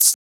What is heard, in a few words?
Shaker; FLPRO